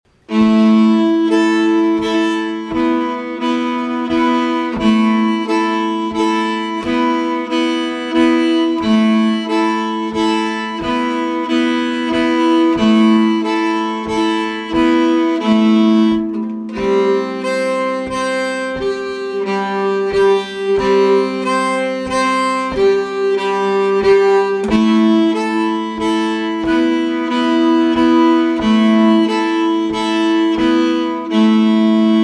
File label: Polska (chords); efter Johan Jakob Bruun; slangpolska southern